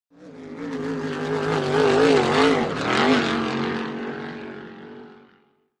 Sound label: Power Boat By Fast, off shore power boat